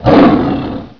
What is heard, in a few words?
pain